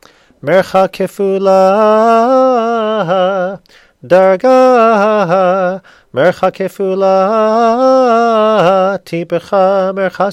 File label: mercha kefula haftarah; bhaalotecha